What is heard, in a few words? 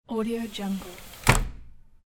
sliding window closing; soundfxpro